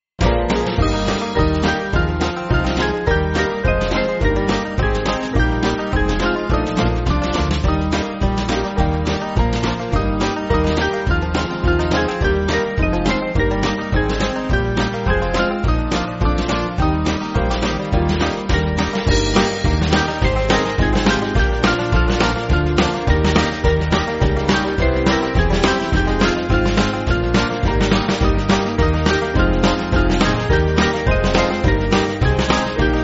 My Faith It Is, Staff Of Faith, S Band

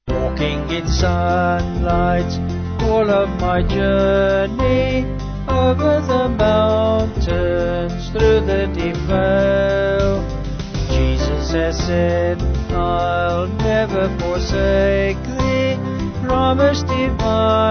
Vocals Band

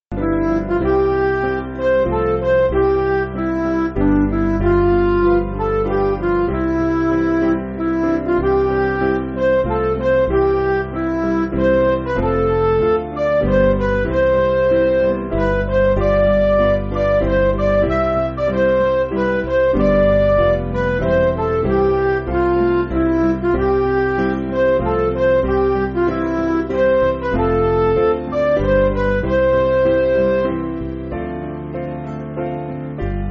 Now I See My Friend, Midi

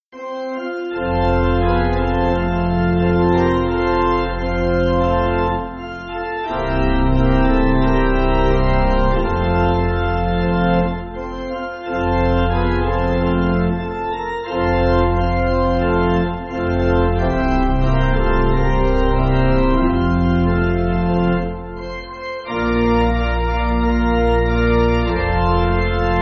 Pipe Organ, Music Backing; The nail-scarred hand; Lubbock

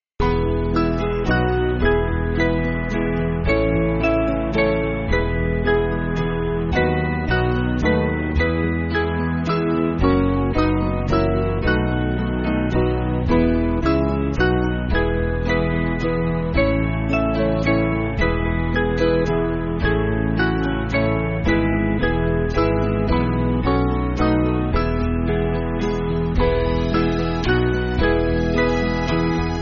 Jesus Has Loved Me, Baptiste, S Band; 4/E

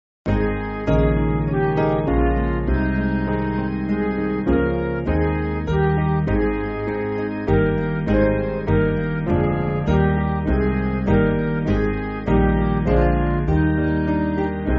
Midi; All Ye That Pass; Wareham

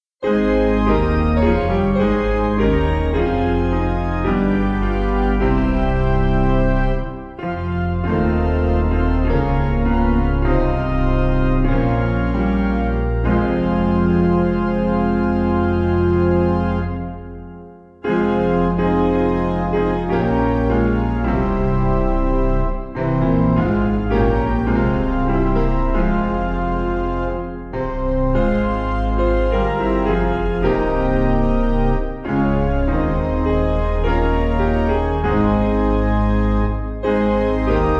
The heavens above my head, Piano; 2/Fm

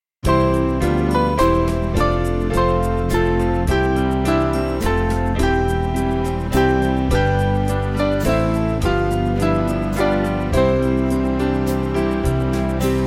Band

